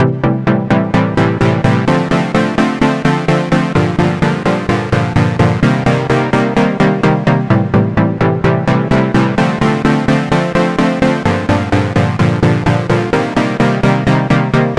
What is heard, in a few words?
Synth Main